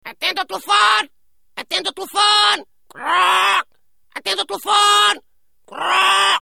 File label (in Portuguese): Papagaio Atende